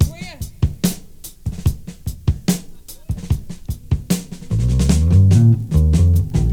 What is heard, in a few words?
73 bpm rock drum loop sample A key